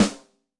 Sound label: Roll Rim, Yamaha DTXpress-II snare